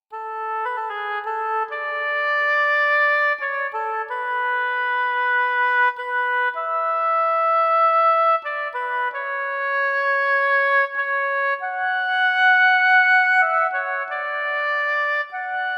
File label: Oboe; VIVO S10